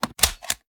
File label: sm uzulu reload fast mag in; gmod; iw8